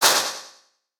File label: windup; En butchered windup2; Fight Knight